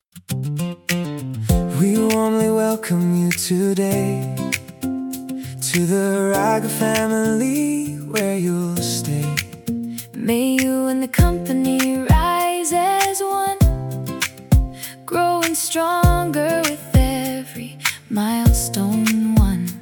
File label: Onboarding Song; corporate